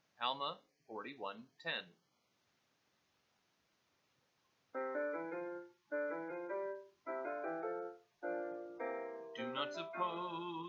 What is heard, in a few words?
vocal